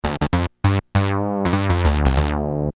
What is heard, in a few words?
EWI